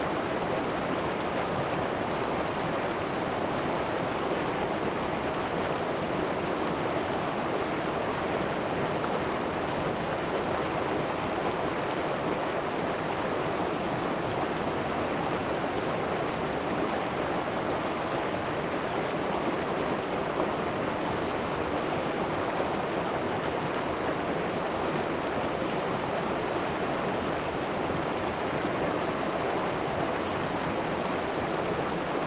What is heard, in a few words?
river rapids rushing between rocks distant